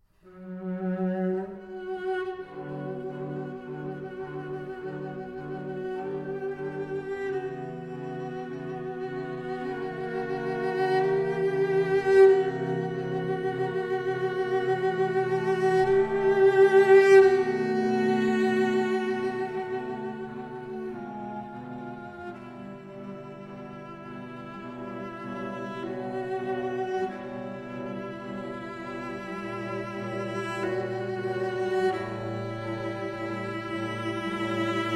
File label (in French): Préludes, in E Minor; Chopin; Op. 28; No. 4; Arr. Franchomme for Cello